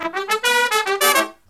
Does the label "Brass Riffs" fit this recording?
Yes